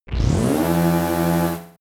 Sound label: machine room alarm